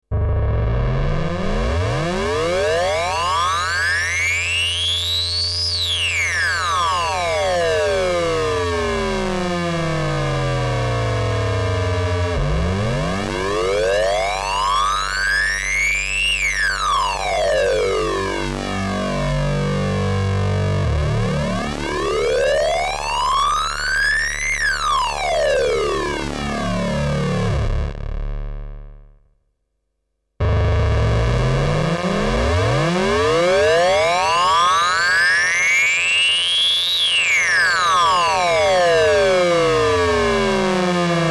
ring mod sync

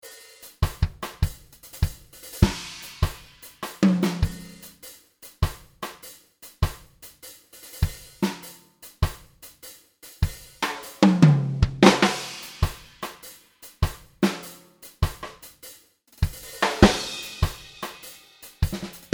Reggae Reggae Set

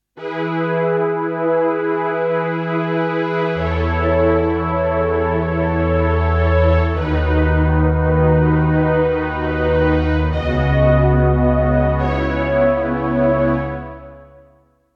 Phaser Strings

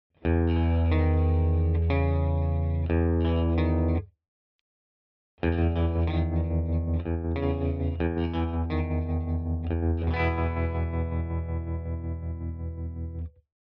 Walrus Fundamental TREMOLO Square

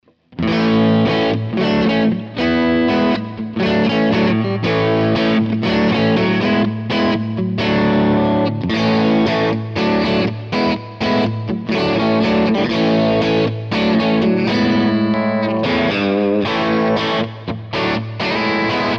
Epiphone Crunch Neck to Bridge

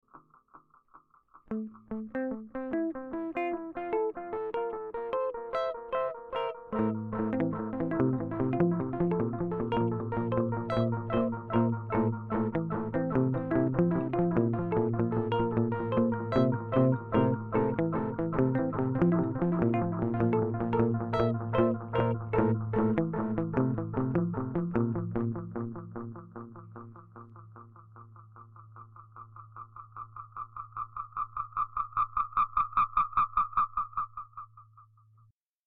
Boss DD 200 Analog

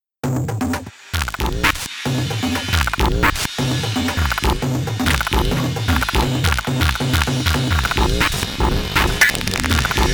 C64 Break Beat

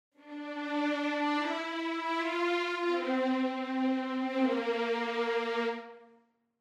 Strings 16 Vns Legato

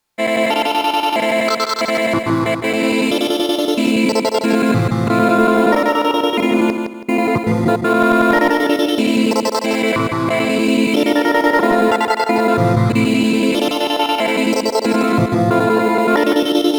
Qun Mk2 Granular Choir; Nunomo

